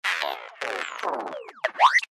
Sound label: glitch power down; sound design